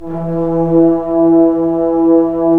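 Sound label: brass trombones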